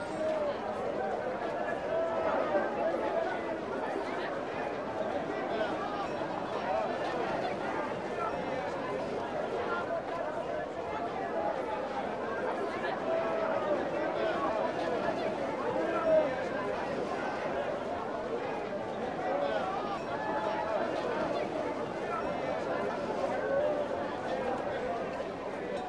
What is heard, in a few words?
town loop; Mount&Blade Warband